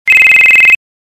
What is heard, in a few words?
ringer warble LOUD